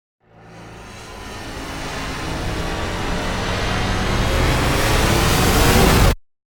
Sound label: Metal wires intense riser